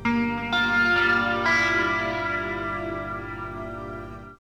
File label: Exclamation